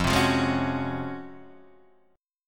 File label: Db Major 11th F